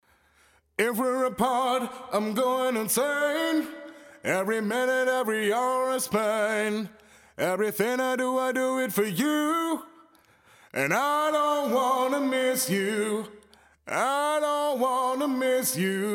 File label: eventide tverb rock vocal